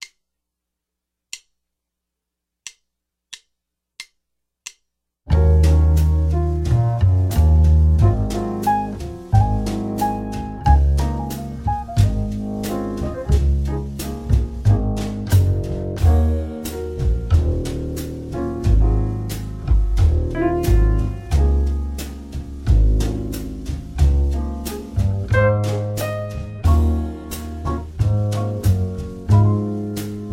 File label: Ebm7 Ab7 DbMaj7 090; Boss